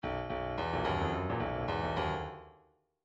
midi grooves funk

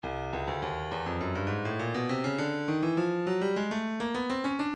breakbeat 102 bpm; forward d; Stylus Breakbeat Midi Files

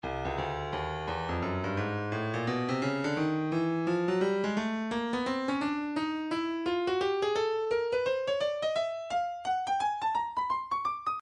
breakbeat midi files 085 089 bpm; swagga b; Stylus Breakbeat Midi Files

